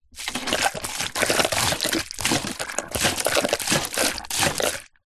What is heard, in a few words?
industrial pump siphon hand plastic squeeze squirt